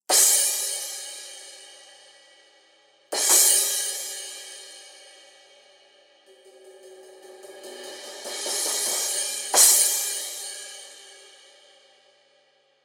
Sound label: Custom Crash 16 Thin